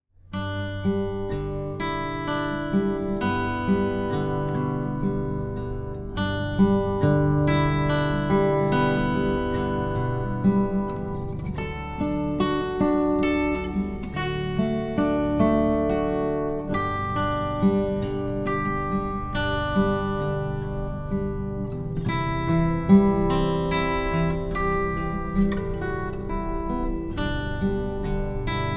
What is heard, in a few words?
Silent Night Instrumental